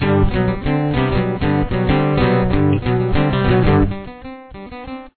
part 2 intro, guitar

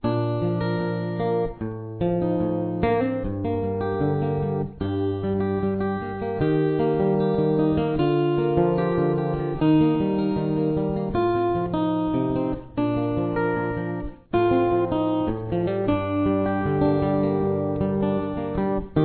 chorus, guitar

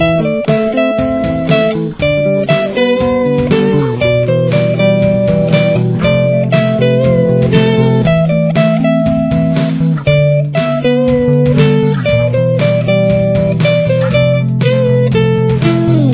solo main, guitar

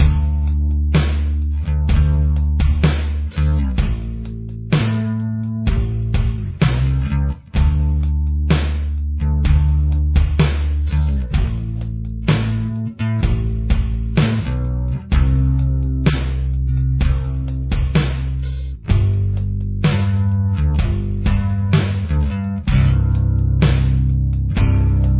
bass

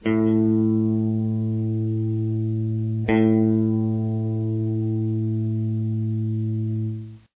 string a, guitar

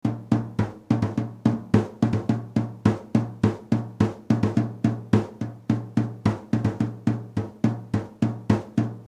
drums

